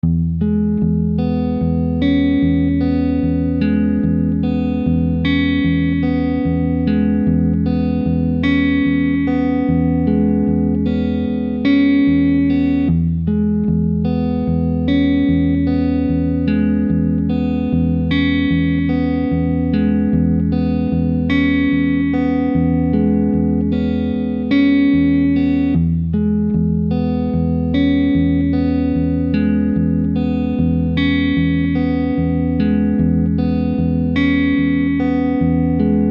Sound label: Slow Kumbengo; West African rhythms